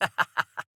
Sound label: Laugh; Quests; Fable Of Garren Rood; Deora